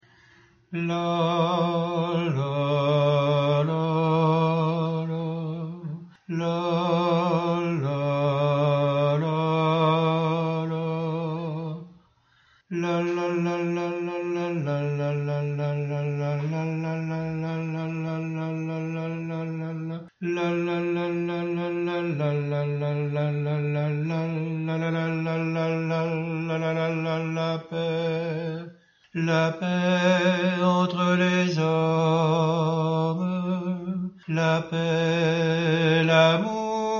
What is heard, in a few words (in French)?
alto; etoile a brille